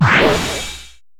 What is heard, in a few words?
Teleport; A Hat in Time; Creator DLC; Vanessa's Curse